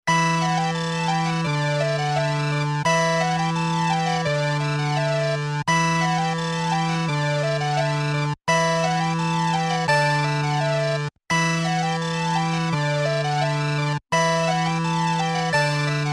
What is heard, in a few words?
Through the Landscape, Game